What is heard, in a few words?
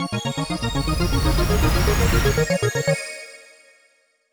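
gacha machine arpeggio; Just Dance 2019; SFX sw gachamachine appegio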